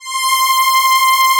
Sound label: Atmo Pads; Best Service; XX Large